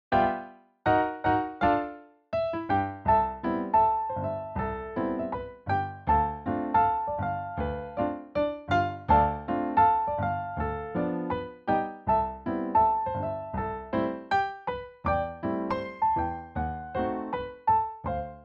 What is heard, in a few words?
BC 2x4 102bpm, ballet music